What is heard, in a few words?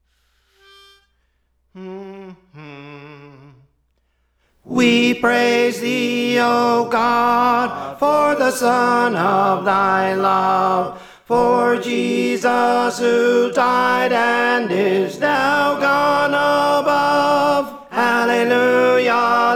Tenor; Praise For The Lord Training